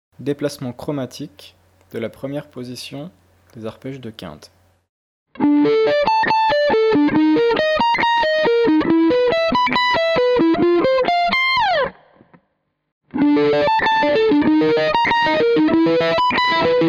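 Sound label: fifth arpeggio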